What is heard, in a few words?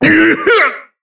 Taking Damage; BBCF; Susanoo